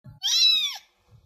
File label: meow